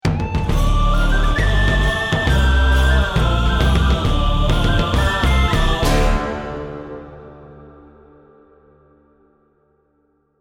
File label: round mvp anthem